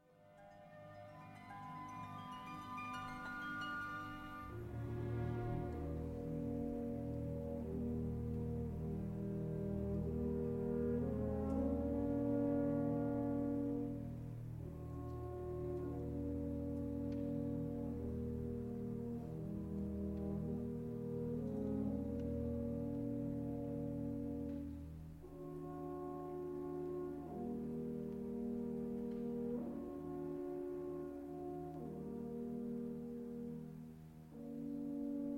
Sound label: Horn Opera Excerpts; Rheingold Scene 2; Solti